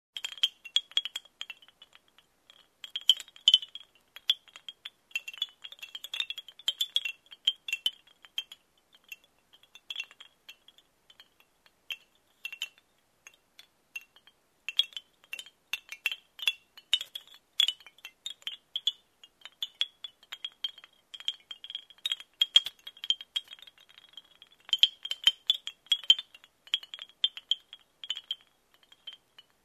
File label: Wooden percussion sparkle